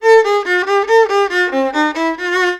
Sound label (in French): VIOLON, ArabianTraditions; USB Soundscan vol.43; AKAI; VIOLRIFF1